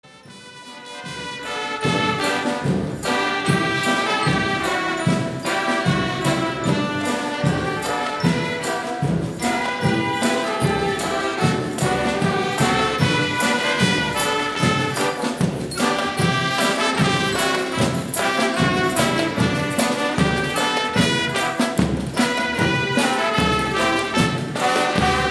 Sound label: Marching Band